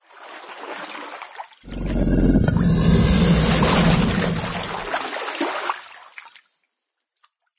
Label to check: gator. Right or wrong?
Right